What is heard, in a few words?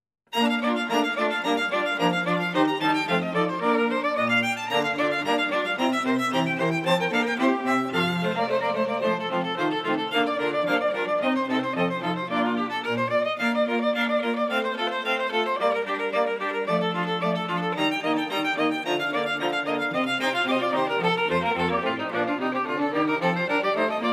String Quartet, Arrival of The Queen of Sheba; Handel